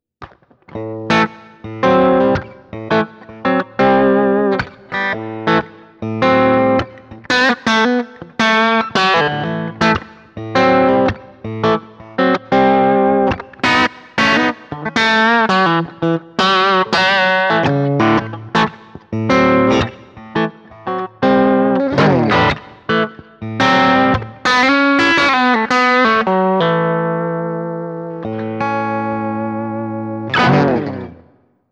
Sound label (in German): Digitale Gitarrensounds British Blue Tube; Kemper